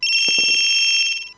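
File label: keitai echo, mobile phone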